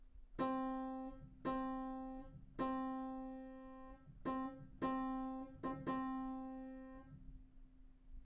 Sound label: cymbals; cymbals crash bang